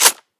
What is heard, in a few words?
rifle bolt closed; Weapons; Guns